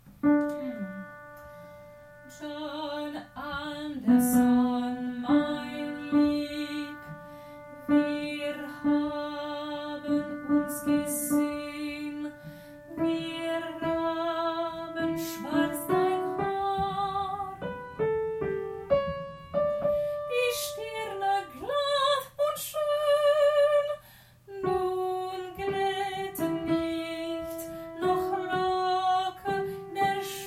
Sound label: alto